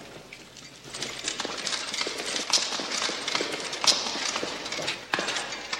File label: ARMOR CLANKING; metallic